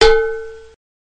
cowbell; tartube

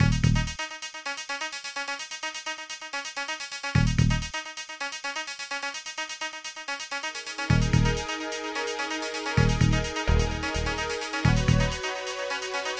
track, ambience; technic game

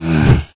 mummy attack; minetest

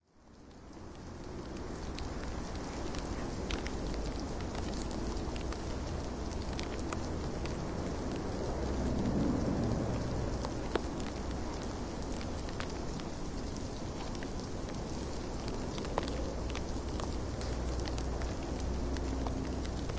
ice crack; ambience; dreambuilder game

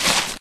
dig crumbly; minetest classic

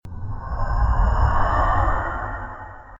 ghost; creatures

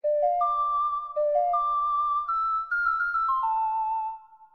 song storms; zelda

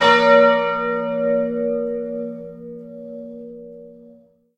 bell small

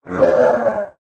animal die; dungeon; animal dm

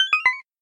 god disabled; minetest mod essentials

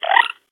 chirrup; dmobs

